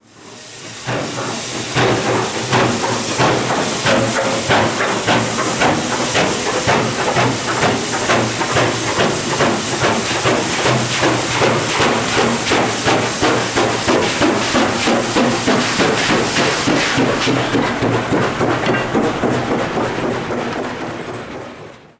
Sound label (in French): locomotives a vapeur; sonotheque